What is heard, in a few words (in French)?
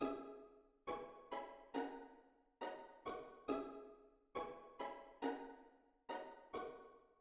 pizz boite mus